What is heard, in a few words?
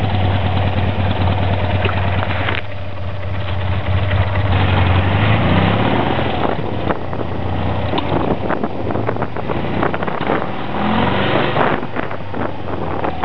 accel; Tune Up